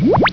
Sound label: bonus